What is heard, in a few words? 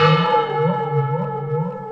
Void Perc; The Void; Processed Drumkits